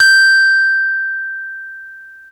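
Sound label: SCARY RHO; RHODES FUZZ; E-MU Producer Series Vol. 8 – Platinum Phatt; EP FX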